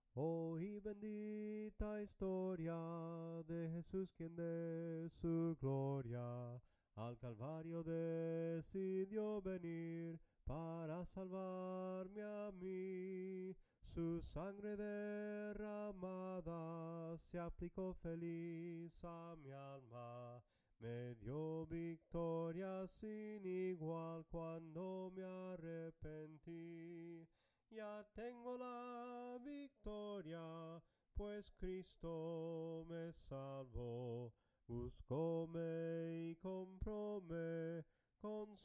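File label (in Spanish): SOPRANO CANTADO, himnos en melodia soprano; VICTORIA EN CRISTO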